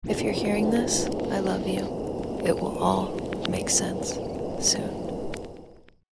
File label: reverse